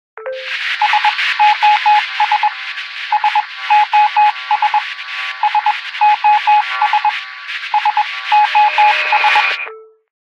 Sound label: SOS Broadcast; Events; DynamicEuropa